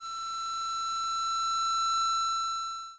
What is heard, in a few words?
flat line